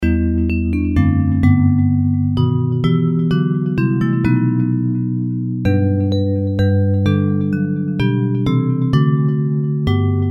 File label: Bells; Hymns